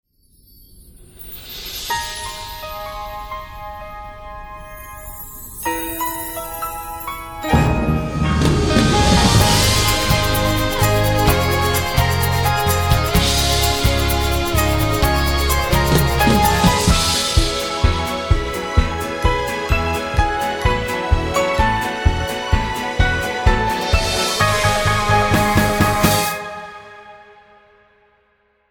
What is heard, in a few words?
jingle; arts international